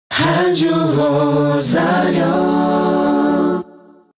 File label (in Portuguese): VH ACAPELLA LENTA; shopping; ROSARIO